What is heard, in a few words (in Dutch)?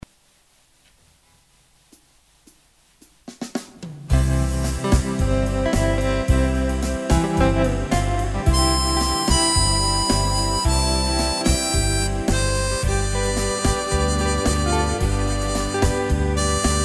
mundharmonica